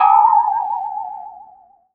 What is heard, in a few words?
ghost appearing